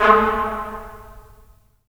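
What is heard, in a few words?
brass trumpets staccato, left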